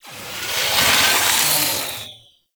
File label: Parasitic Capsule Leech; Entrati; Conquest